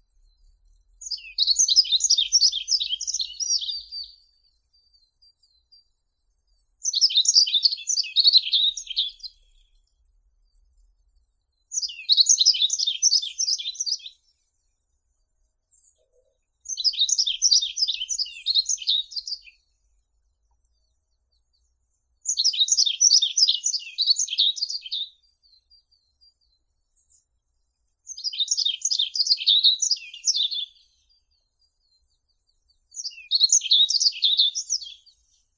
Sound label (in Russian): ovsyanka zheltogorlaya emberiza elegans; zvuki prirodi